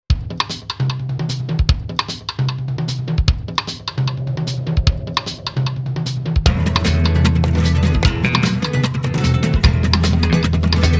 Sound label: SPANISH RACE